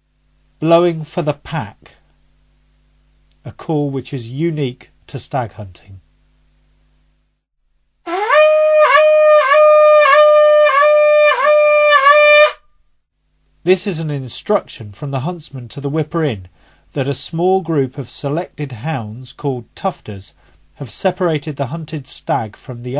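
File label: blowing for the pack, hunting horn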